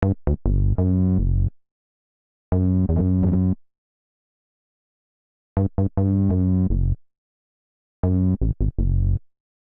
F# Minor 87 bpm, Bassline; MIDI Clips; Tonal; Slow Pull Back; Ableton; Factory Packs; Chop and Swing

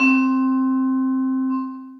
Vibraphone Hits, Orchestral Mallets; Ableton

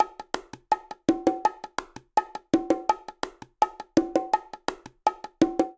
Rhumba Funk Bongo 166bpm, Latin Percussion; Ableton; Factory Packs

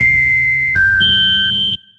Whistle and Hum; Chromatic Voices; Transformed Voices; Ableton; Factory Packs; Voice Box

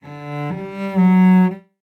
Cello Phrases G Major (120 bpm); Instruments; Distressed Strings; Ableton; Mood Reel

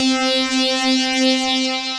Catacomb Lead, Synth Lead; Ableton; Factory Packs; Vibrations; ModeAudio

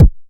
analog attacked, Kickbank

